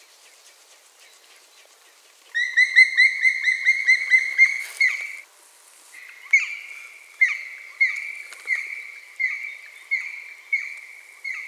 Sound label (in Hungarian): fekete harkály hangja